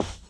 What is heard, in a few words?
Foot Man Carpet